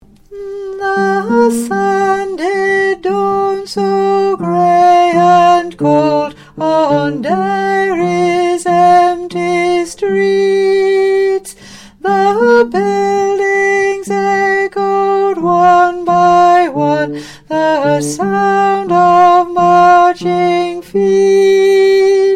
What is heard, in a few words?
Tenor; Derry Streets